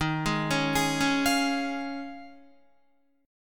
D sharp 7th Suspended 2nd Suspended 4th D sharp, Guitar; arpeggiated; 10,11,13,13,13,13; 4F,4A,5C,5G,5C,6E; D#7sus2sus4; JGuitar